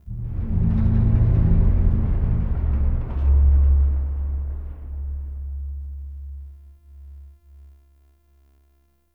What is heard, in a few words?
Lfe Metallic Boom; Warframe; Ambience; Entrati; Dante Unbound Leverian